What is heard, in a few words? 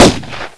magnum; Tomb Raider PS1; lara